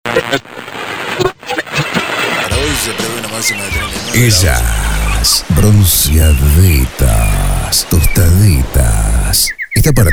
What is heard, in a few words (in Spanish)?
Rock Pop